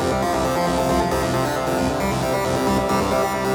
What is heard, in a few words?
Full Organ; Techno (Техно)